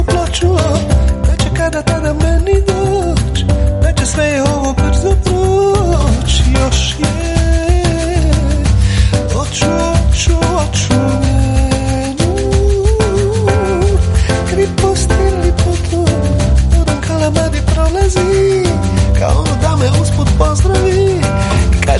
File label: Piano